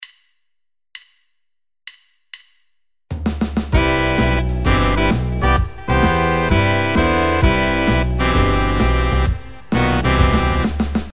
Jazz Horn Section

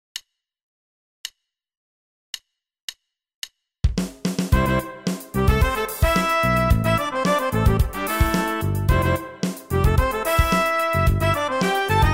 Pop Horn Selection

